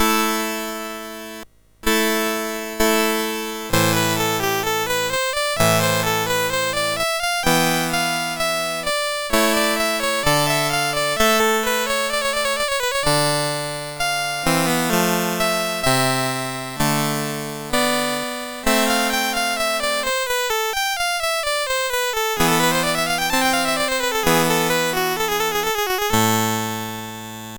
Intonazione, MOS6581R2

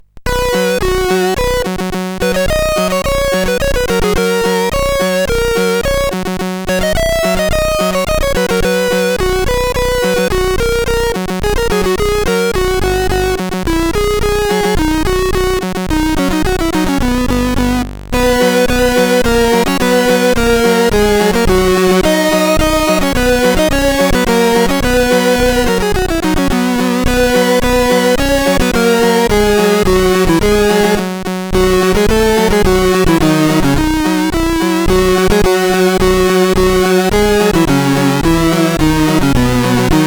Mehter Marsi, CSG8580R5

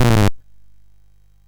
MOS6581R2, GAMES; Sammy Lightfoot

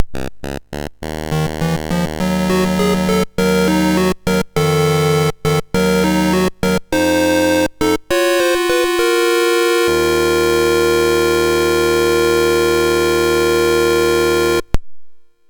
sid MOS6581R3; Perry Mason Mandarin Murder

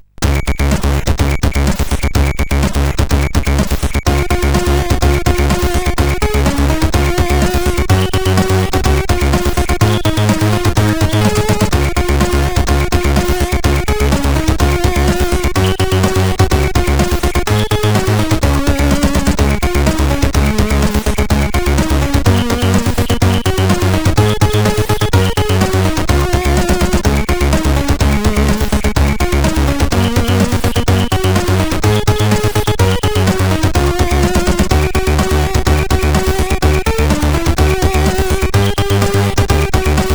Christmas tune, sid, CSG8580R5

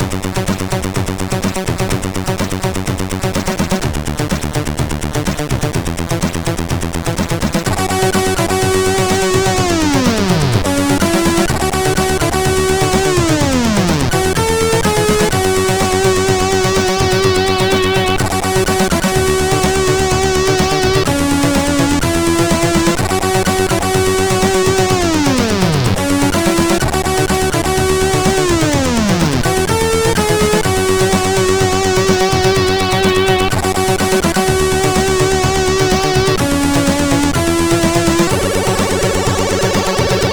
Tune sid CSG8580R5; hvsc

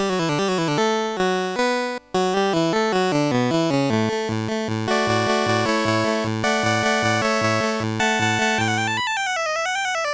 Allegro, MOS6581R2

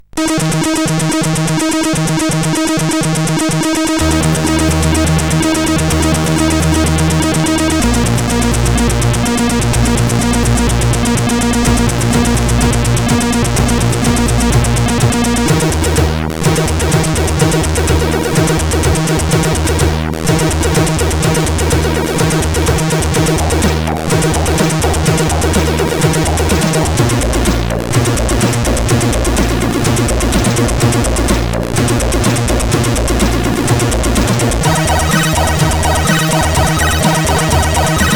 tune sid CSG8580R5; Siebold Markus

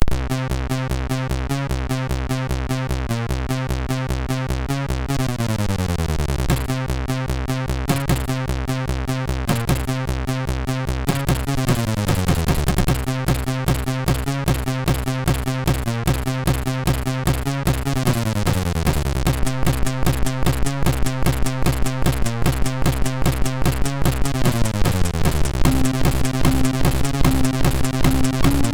Life Is Brutal, MOS6581R3

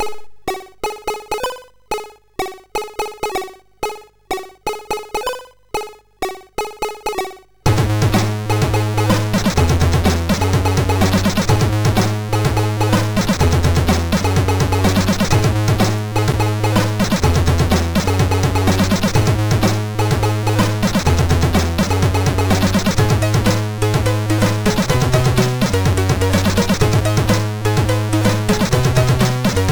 Rap, sid MOS6581R3

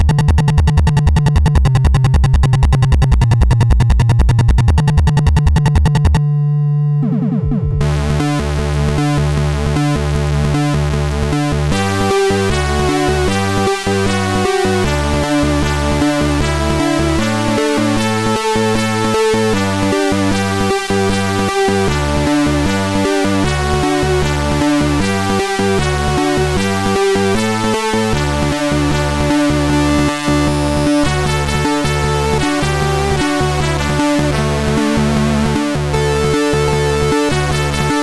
Orchestra, sid CSG8580R5; STP Sound System

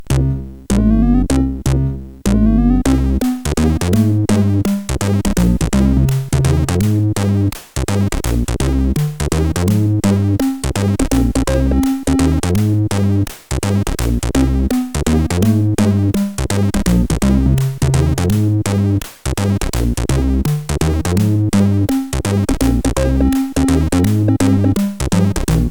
Jazz Miniature, CSG8580R5; hvsc; Froyd

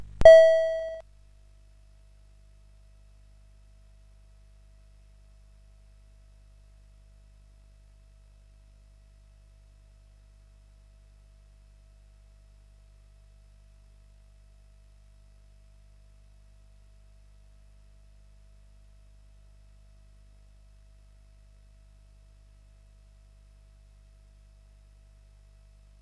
CSG8580R5, GAMES; Witch Switch

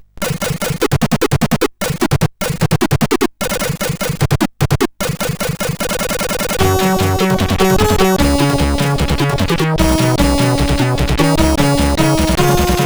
Pavement, sid CSG8580R5

